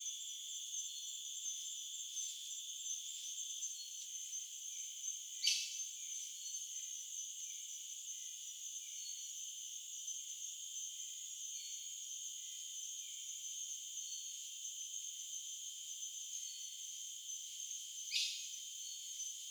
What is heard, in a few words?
Ouah